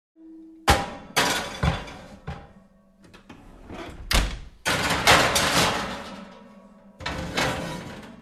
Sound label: Kitchen oven removing the metal plate